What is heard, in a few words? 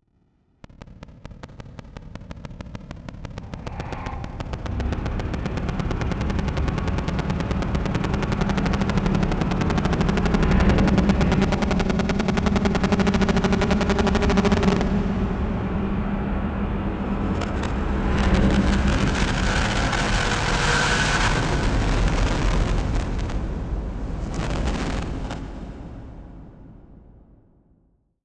Reverse Static Clicks; Module Production Element Title Imaging